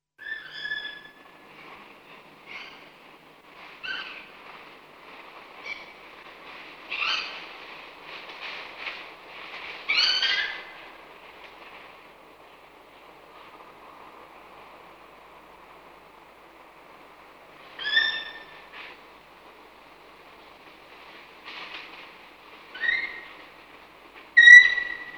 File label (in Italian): Daino Dama dama, Versi Animali; Il Forum della Fotografia Naturalistica